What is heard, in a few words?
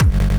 Kick, Psy style G; Drums & Percs